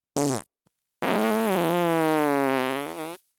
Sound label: fart; vegan